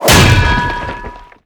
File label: Anvil Rain Impact; MagicItem AnvilRain SFX Impact 03